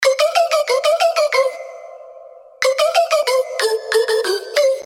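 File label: upbeat sms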